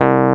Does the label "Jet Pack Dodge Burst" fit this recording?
No